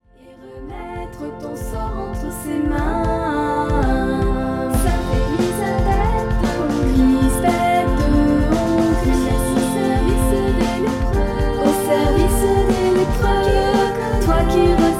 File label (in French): basse mono; sainte elisabeth de hongrie